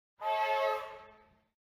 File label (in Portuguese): Buzina de trem